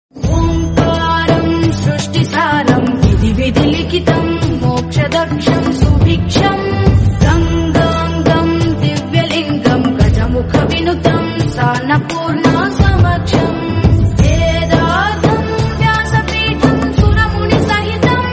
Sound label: worship lord shiva devotional song